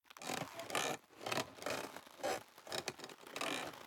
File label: Sign Flap; The Town